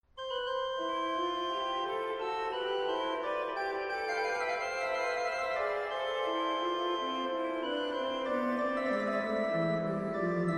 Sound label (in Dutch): Orgelvers